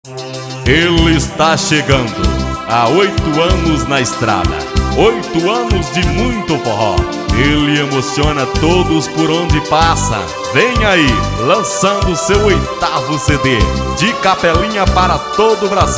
teclados abertura

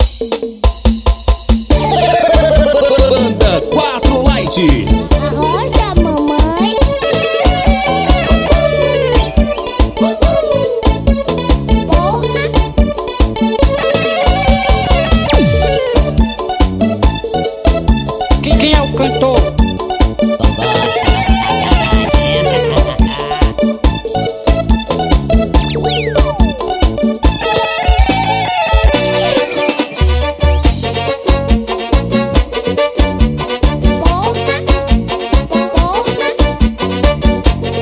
abertura

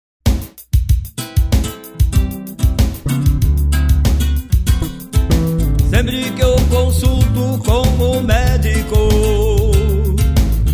programa voz violao

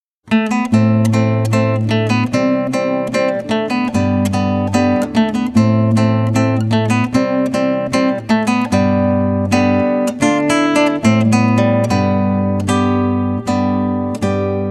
danca do reino

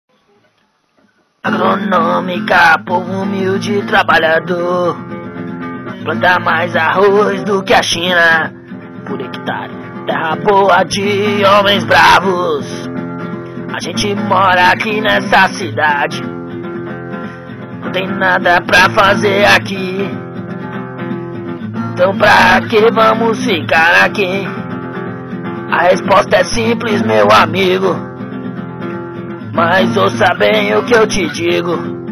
hino; agronomica

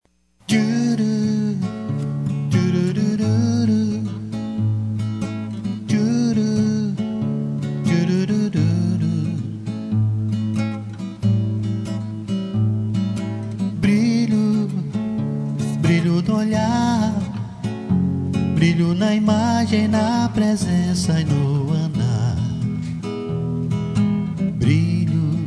brilho violao voz

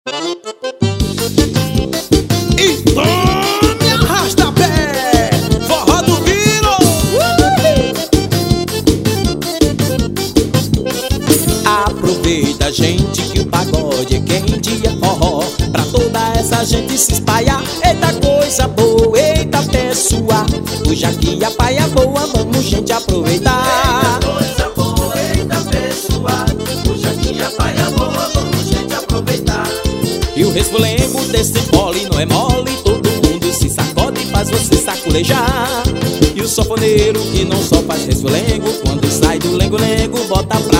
forró

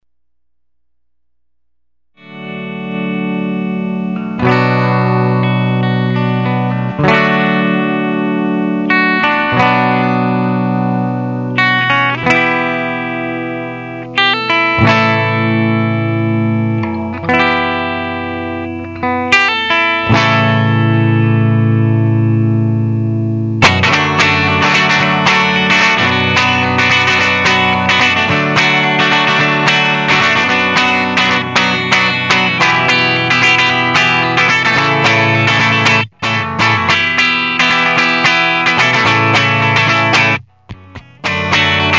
sub solo

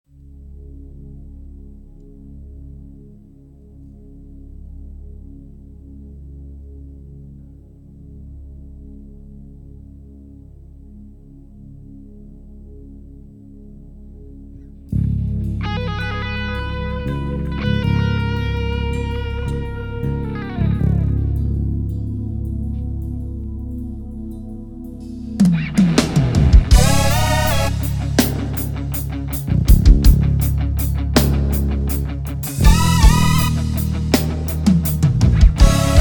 abertura ao vivo

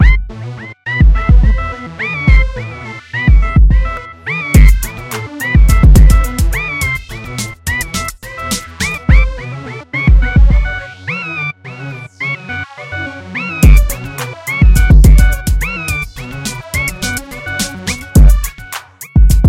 loop apito 105 bpm

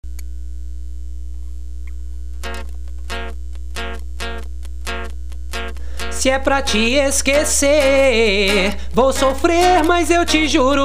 universitaria

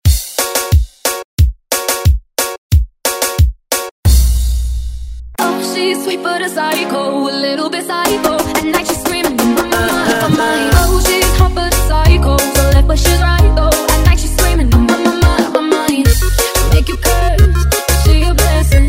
tecno melody

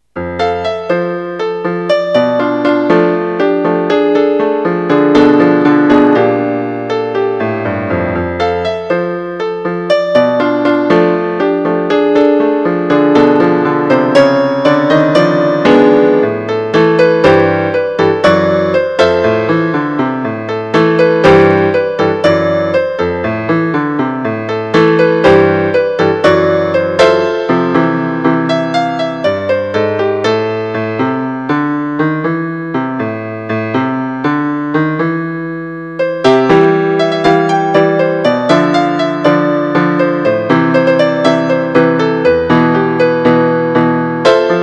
estudo para piano

